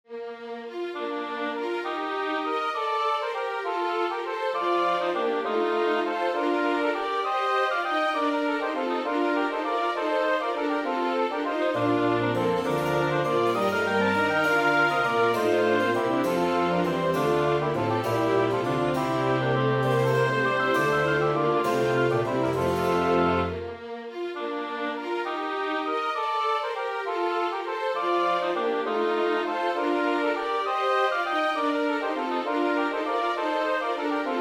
orchestration suite pour orchestre baroque gigue; hwv440

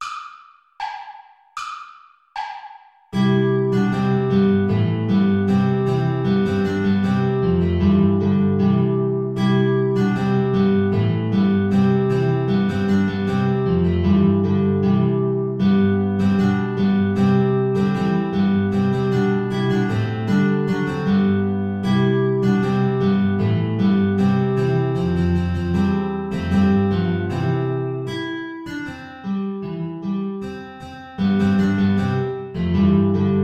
pupa canique play along without flute; traditional

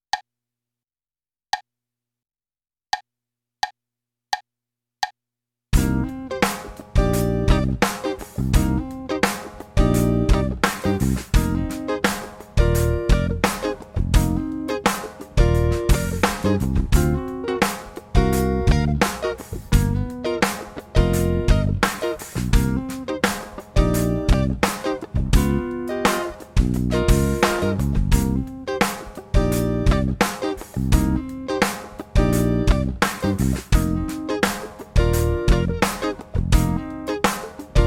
funk groove; bergeron guy

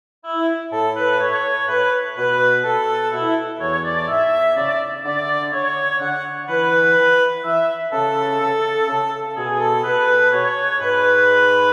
mazurka; mazurka saint patrick

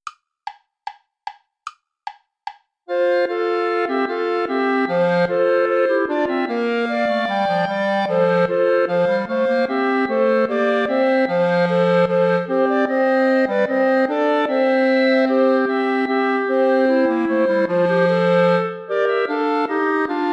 bourree play along without clarinet; praetorius michael iii

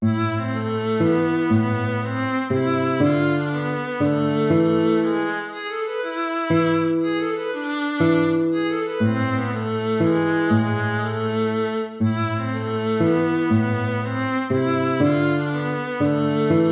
baroque minuet minor

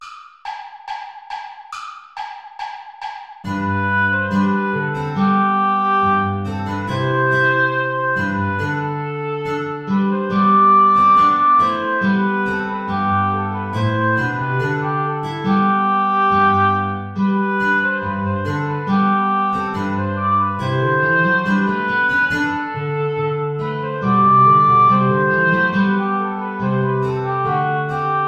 play along without guitar; praetorius michael ballet

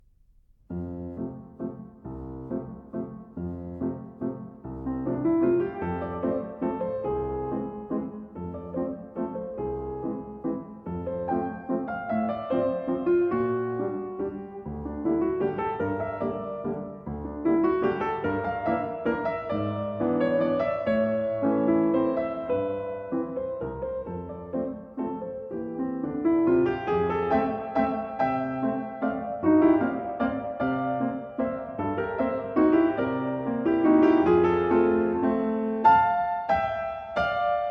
lyrical waltz; dmitri shostakovich

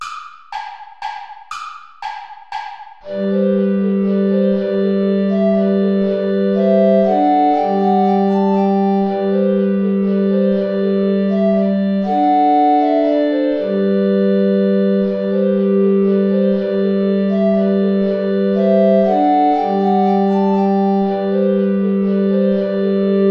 saltarello play along without soprano recorder; praetorius michael

